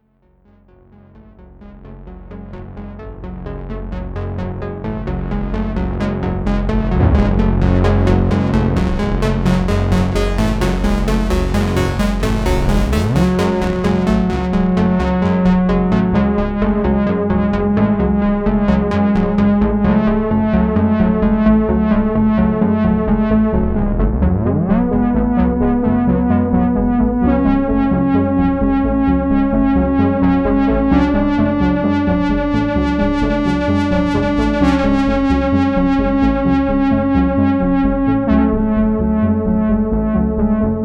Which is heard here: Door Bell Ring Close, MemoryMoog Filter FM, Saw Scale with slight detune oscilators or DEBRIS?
MemoryMoog Filter FM